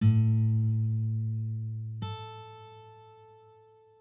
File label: Octave, guitar